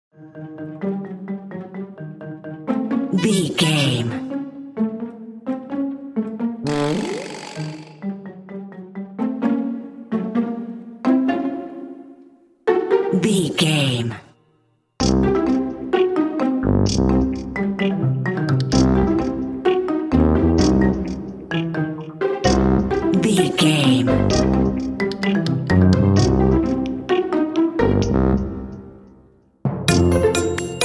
silly fun

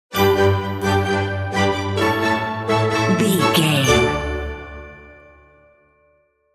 joyous occasion stinger

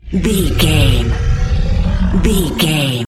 large monster roars

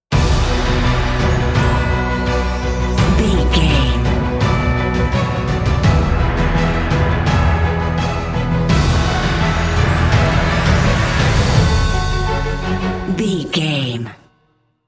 epic emotional

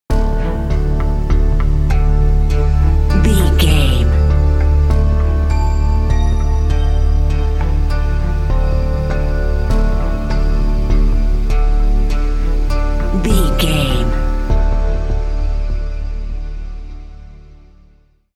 building worry and tension bumper

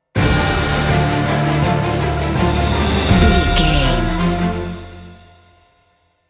cinematic corporate sting bumper

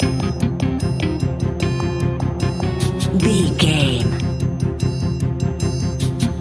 suspenseful ticking loop

